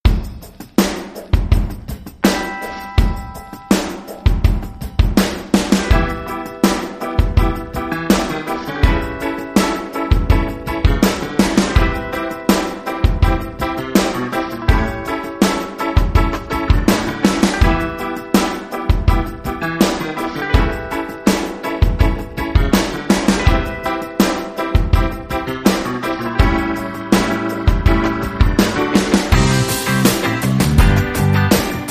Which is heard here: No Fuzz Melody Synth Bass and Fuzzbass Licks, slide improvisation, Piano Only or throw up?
No Fuzz Melody Synth Bass and Fuzzbass Licks